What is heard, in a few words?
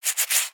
Owl Scratching; creatures organic; Owl Backpack; aronwk collection; ndaudio